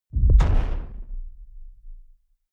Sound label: sci-fi underwater shot soft